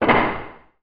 impact heavy object drop down on hard floor inside building med distant perspective